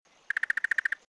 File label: eleutherodactylus pentasyringos port antonio jamaica; male; north-eastern Jamaica; Its call is longer than that of its sister species, E. pantoni.; West Indian